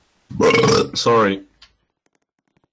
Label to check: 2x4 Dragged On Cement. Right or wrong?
Wrong